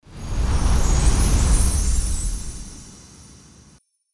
CHRISTMAS WIPE

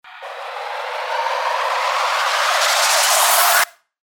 RISER

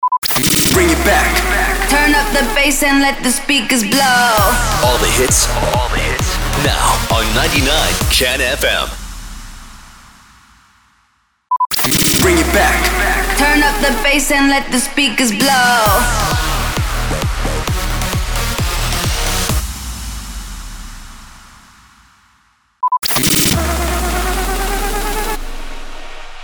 SWEEPER; REJOIN

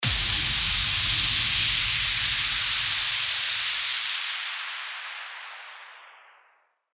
WHOOSH

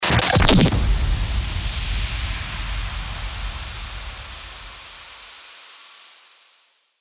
STARTER WHOOSH

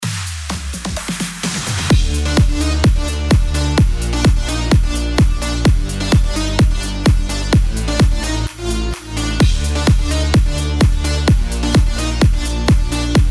ELECTRO HOUSE DMIN 128BPM

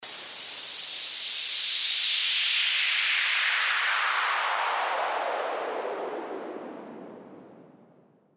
WHOOSH

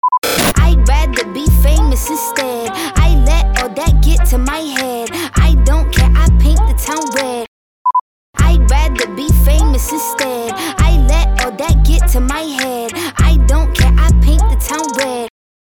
100BPM F

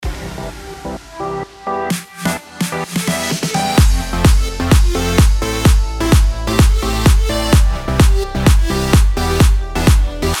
BED EDM FMAJ 128BPM

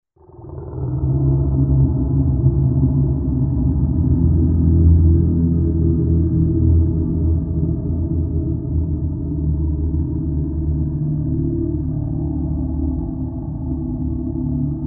HALLOWEEN MONSTER GROWL

